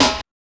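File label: HSE-SD, house snare drum; X-Static Goldmine 1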